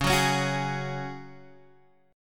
C sharp Suspended 4th C sharp, down, Guitar, Half Step Down